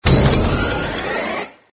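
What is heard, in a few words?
notification message; Stellaris; ringtone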